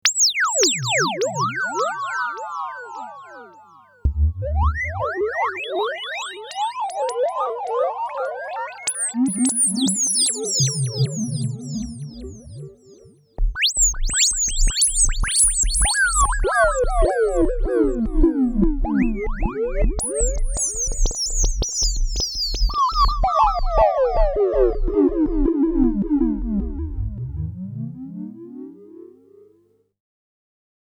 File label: Kaossilator